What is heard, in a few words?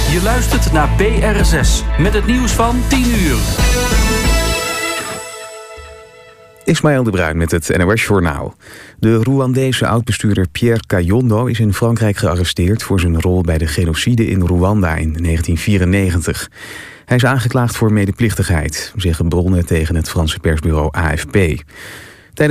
Jazz